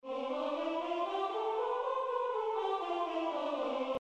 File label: C major scale